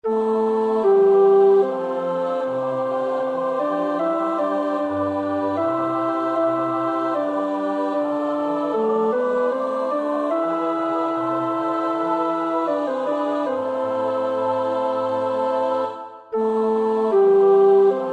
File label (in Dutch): tenor, koorpartij oefening; Bach (Johann Sebastiaan); BWV 111